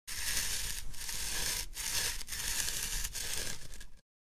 Snooker pool cue plaster

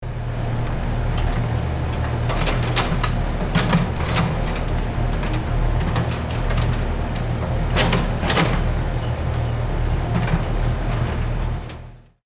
Excavator construction vehicle digging

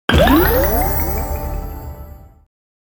Game bonus reward

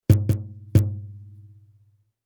Elastic rubber band plucking multiple

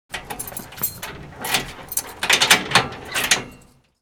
Prison high security cell door metal opening

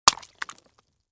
Golf ball into a water hazard

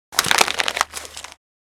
Plastic paper short rustle

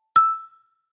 Bright pop up

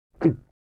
Swallow gulp eating

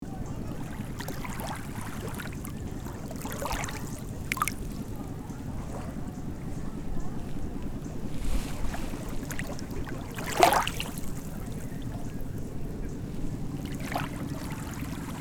Boat ambience waves lapping in close perspective

